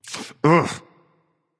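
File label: non verbal ouch, voice; cernunnos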